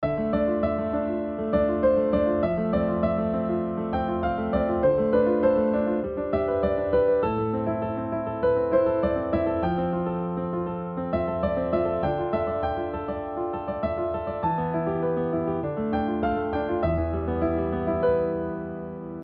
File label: chords+melody